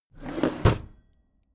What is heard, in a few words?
packed drawer smooth open heavy